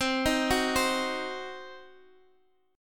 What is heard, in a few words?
C Diminished, Guitar, DADGAD